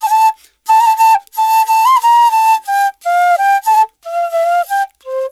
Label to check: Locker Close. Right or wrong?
Wrong